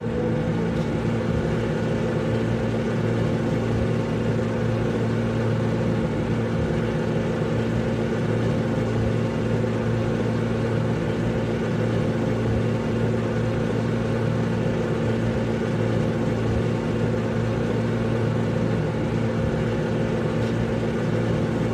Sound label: robotic machine long